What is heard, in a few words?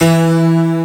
Melody Shot E, dubstep; One-Shots; Melodies; Glitter; Ghosthack; Dubstep Sounds 2025